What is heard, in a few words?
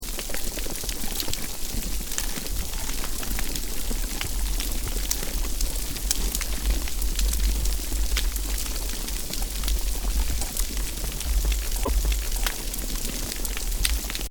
shrimp